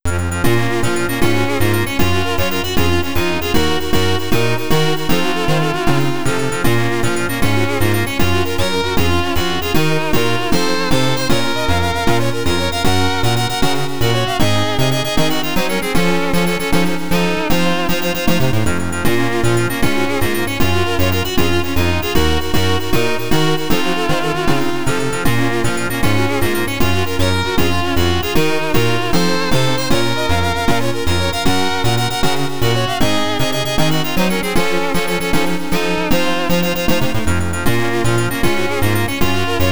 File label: BGM (YM2203); New City Hero